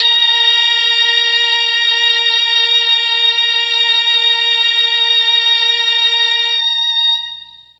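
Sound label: A#3 Strings Brass, Mellotron; Mike Pinder - Mellotron Archive CD; STRGS+BRASS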